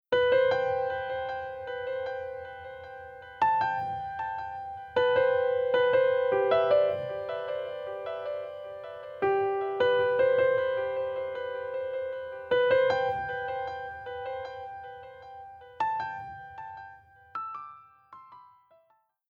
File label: Piano Delay Ping Pong; Reverb